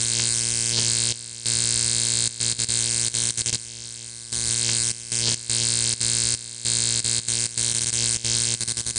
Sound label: loop neonlight buzz; Doom